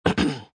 Cough Polite, Male clearing throat, short, (human voice); Sound Effects:Human; VoiceBox